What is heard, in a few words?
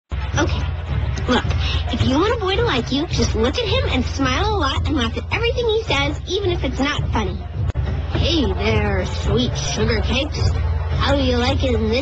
azula laugh